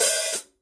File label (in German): Paiste 13 PST 3 Hi Hat Becken